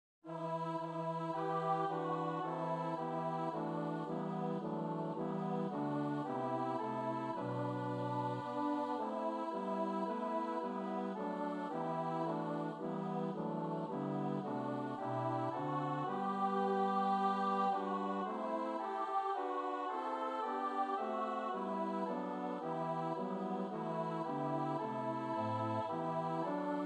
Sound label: alto; God Rest Ye Merry